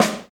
tape jazz steel snare drum g sharp key, acoustic snare tuned to the G# note